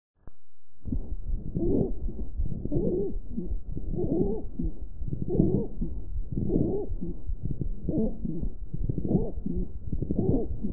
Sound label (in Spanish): Sibilancia Pre-Escolar